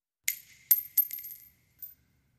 Shell Case Sngle Revb, shell casing movement

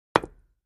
Patio Block Hit Sledg, concrete & brick

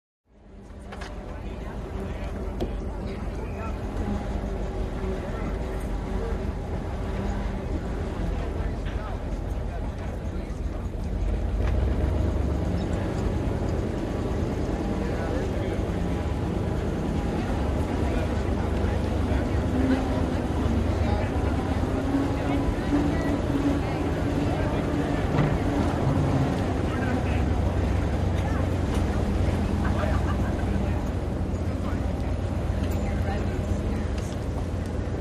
Boat Race Pit Doc Amb, motorboats

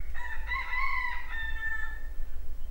Rooster Cry in Distance

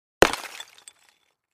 Clay Chimney Vent Hit, concrete & brick

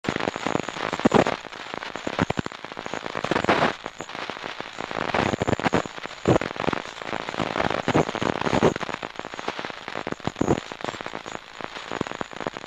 Static Headphone Static

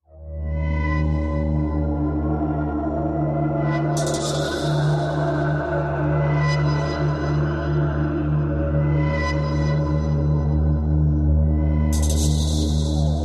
Black Mood Electro Stat, horror

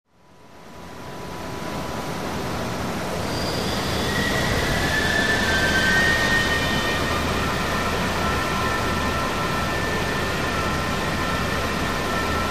Loud Fan Screech Mach, conveyor belt

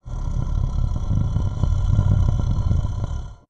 monster growl, breathing

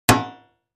Aluminum Gutters

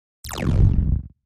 Wash Elect Laser Dist

